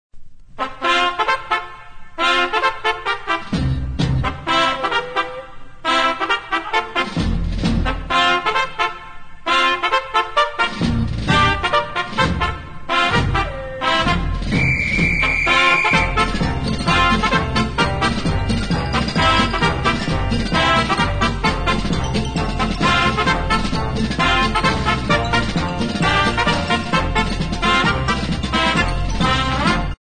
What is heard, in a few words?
Magic Trumpet